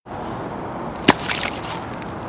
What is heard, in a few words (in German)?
Stein